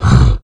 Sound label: grunt, roshan; dota; creep